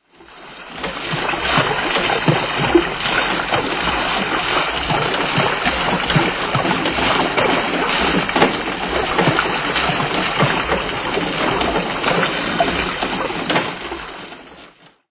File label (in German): Wasserrad